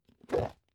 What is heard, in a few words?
plastic bowl small open rough